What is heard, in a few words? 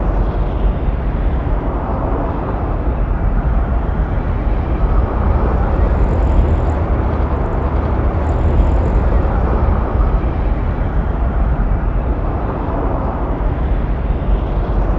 dist traffic, ambience